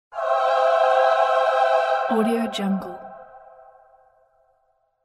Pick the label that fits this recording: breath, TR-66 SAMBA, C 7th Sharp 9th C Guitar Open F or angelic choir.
angelic choir